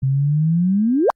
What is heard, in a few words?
Star Crash